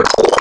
Growl Wavetables; Nails; Cymatics; SERUM; Virus Wavetables

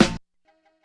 Snare, 085 Cmin

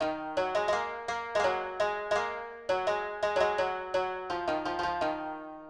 Koto, 084 Ebmin, Hip Hop Exotica; Apple Loops; Big Fish Audio

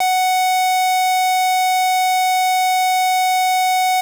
square, 808, SubOsc

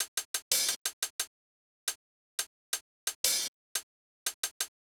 Hats, Hip Hop Exotica, Drum Tracks, 088, Gmin

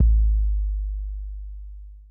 Kick B Hit; big fish audio; Hip Hop Exotica; Fmin

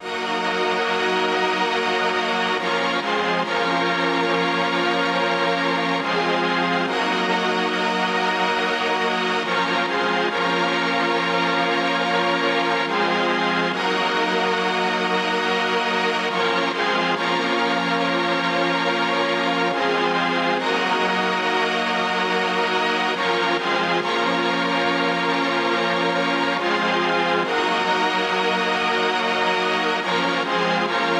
140 BPM D# Min Chords; Cymatics; Destiny Gold Expansion Stems; Hall Of Fame

